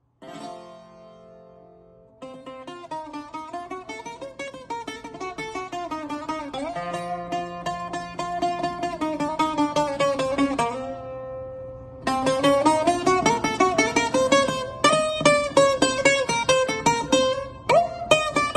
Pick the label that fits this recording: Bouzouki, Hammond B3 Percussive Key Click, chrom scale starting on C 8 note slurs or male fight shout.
Bouzouki